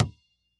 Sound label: collision plastic; flip the water